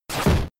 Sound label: Swipe; False Swipe